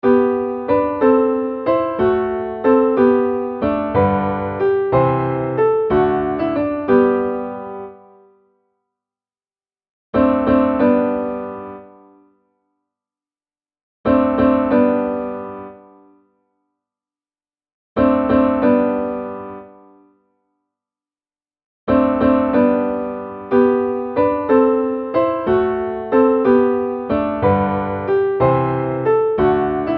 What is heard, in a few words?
barcarolle hilling acct sol 92, basson